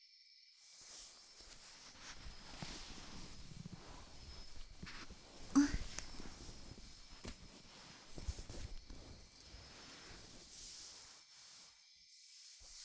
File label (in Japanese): 添い寝 左耳かき, ASMR; おやすみ耳かき